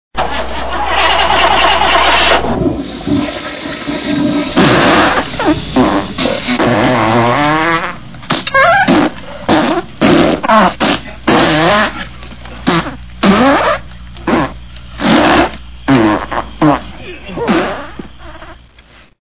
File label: car fart